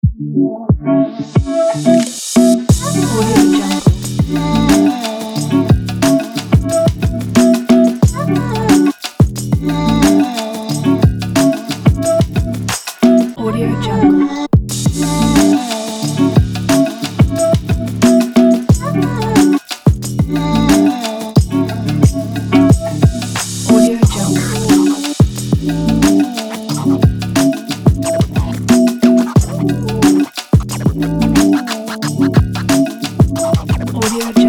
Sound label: hip hop; eliansproductions